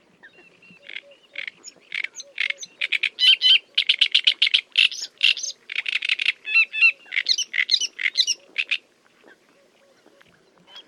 great reed warbler - whooper swan - black headed gull; soundscapes